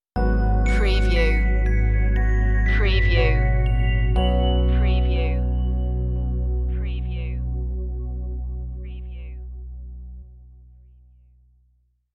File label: open universe stinger